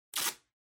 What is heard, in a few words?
Sellotape Peel Off